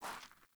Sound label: Grunt Boot Walk Gravel; Characters; Enemy; Melee Grunt; Footsteps; Boots; Sinecure